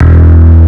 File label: bass; osu